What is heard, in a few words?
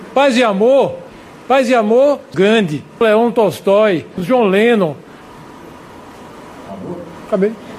ringtone